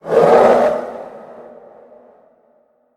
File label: spear Yell Voice